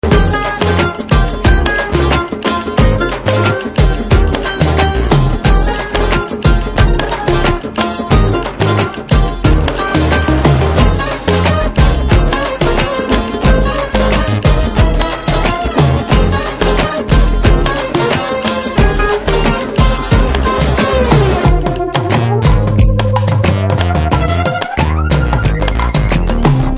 instrumental tone; Bollywood

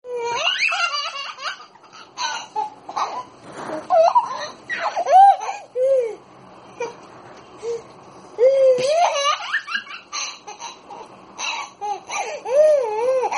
laughing; Children

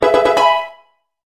level up; Pokemon Black & White

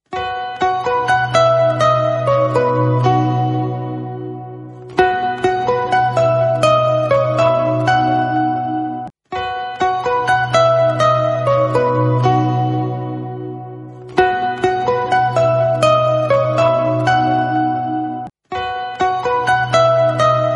standard smartphone

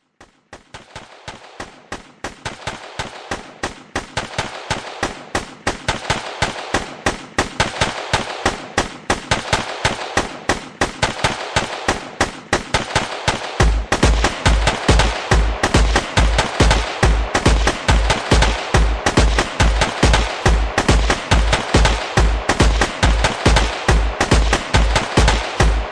gun sounds; Battlefield 2

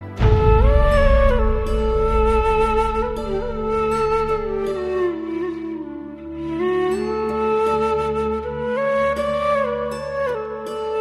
flute emotional

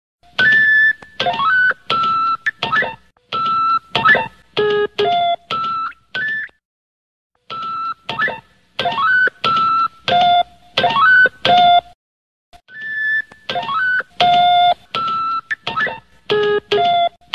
iphone 7s alarm beep

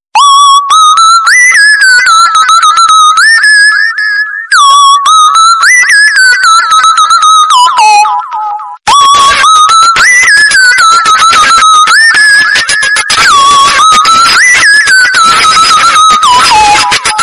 high sound crazy tone

